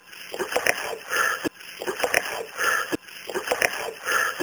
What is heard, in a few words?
EVP Loop